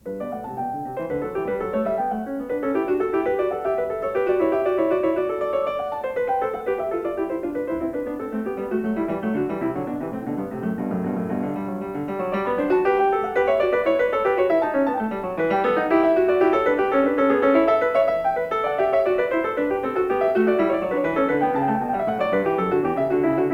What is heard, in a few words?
Variation 2 a 1 Clav; Glenn Gould; Bach; Goldberg Variations; Variation 17 a 2 Clav